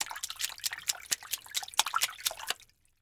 Liquid Water Water Hands Splashing On Top Of Water